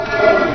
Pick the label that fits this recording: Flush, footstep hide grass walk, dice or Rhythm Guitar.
Flush